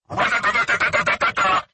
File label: shoot; loader